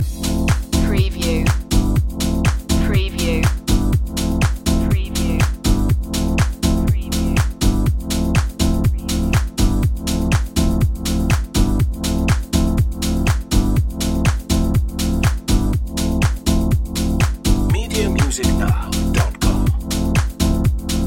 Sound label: deeper grooves loop